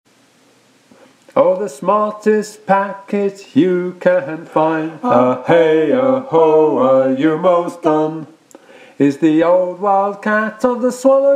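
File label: Clear away the track - soprano, sea shanty teaching track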